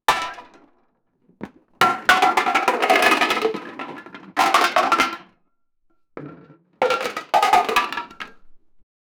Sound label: plastic blocks are fallin; household